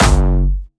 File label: Jumpstyle Kick F#1; Bassdrums; Jumpstyle Multis; vengeance essential club sounds-3; VEC3 Bassdrums